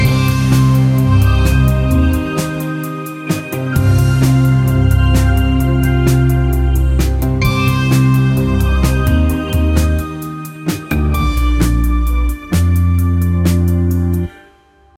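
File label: adventurous positive music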